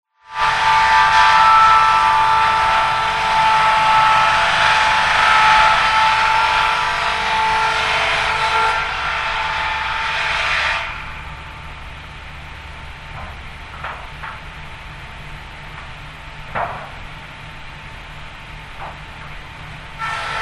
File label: contemporary polish village music